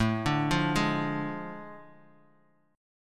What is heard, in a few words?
A Major 7th Suspended 4th Sharp 5th, Bass Standard; AM7sus4#5; arpeggiated; JGuitar